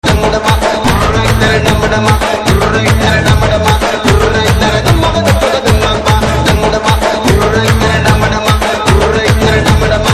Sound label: DJ Telugu Ringtone; Kanchana 3